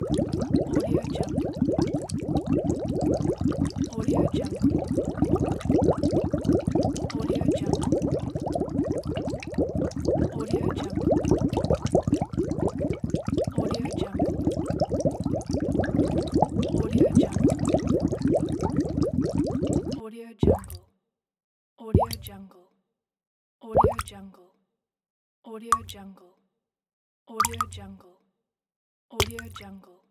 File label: bubbles